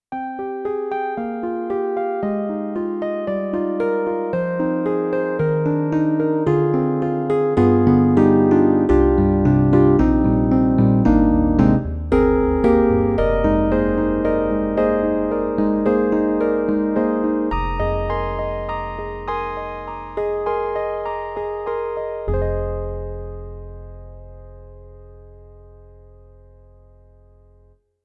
Yamaha MU90 Mellow Electric Piano 1

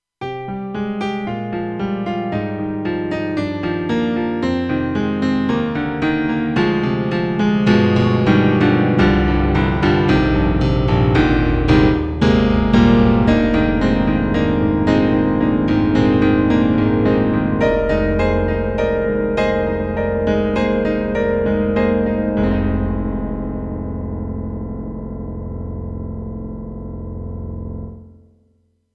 piano 16', KORG 05RW

